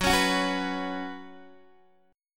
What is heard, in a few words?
F sharp Suspended 4th, Guitar, Open D